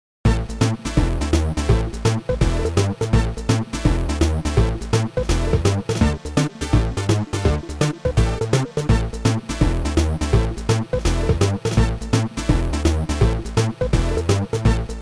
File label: blue'n'analog; coma favorites modland